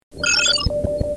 hailing frequencies open, computer

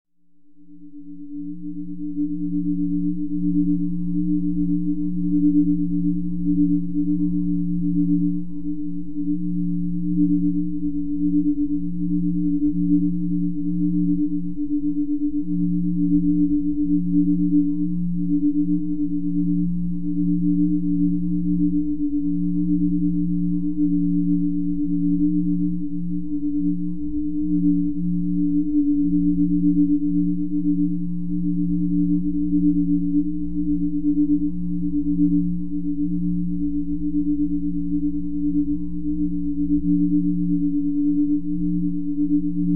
corridor; background; TOS

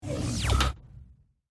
alcove regen cycle abort; alien sounds; borg

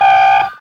keypress

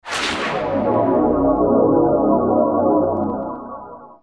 tractor; TNG

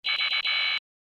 alert, computer

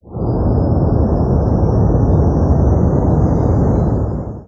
engine; background; tng

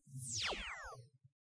power down

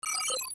viewscreen off

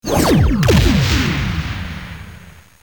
weapons clean

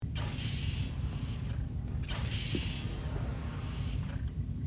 ent door

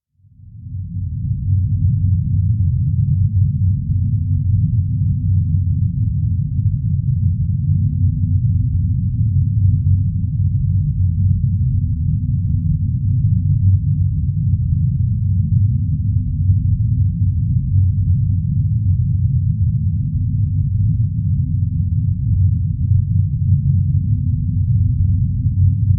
ship hum; background; TOS

